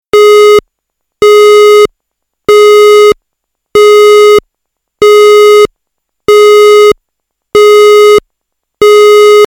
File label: Alert Only